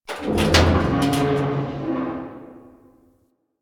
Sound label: Dumpster Creak Groan C; Warframe; Ambience; NineteenNinetyNine